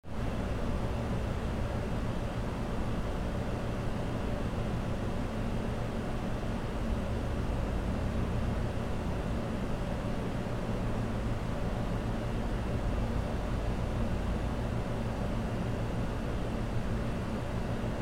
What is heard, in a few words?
Noisy outside